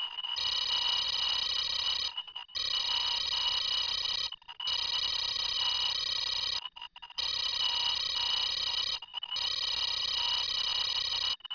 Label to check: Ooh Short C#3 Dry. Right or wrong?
Wrong